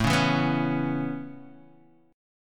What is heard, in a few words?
Db Minor 7th Sharp 5th A